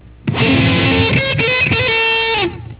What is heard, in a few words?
electric guitar